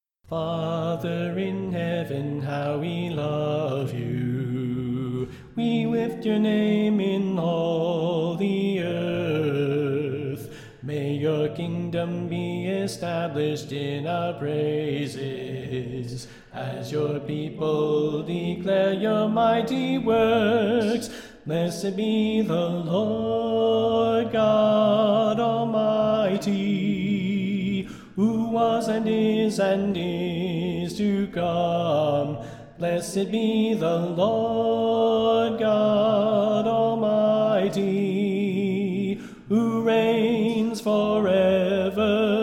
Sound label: Soprano Left